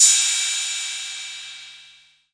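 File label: 808 cym, cymbal, drum machines; 808 Extended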